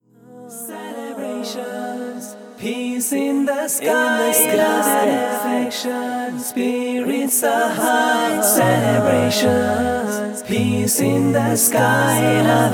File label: Karaoke Version